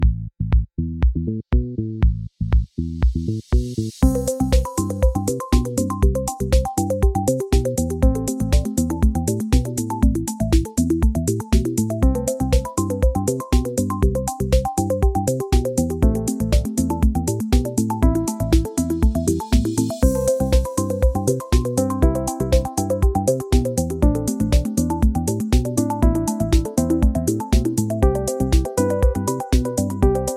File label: chill loop